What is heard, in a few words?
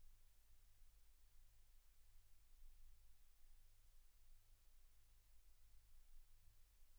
silence 48kHz